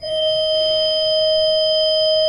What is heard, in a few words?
SWL D4, Cathedral Organ, SWELL WERK; Propeller Island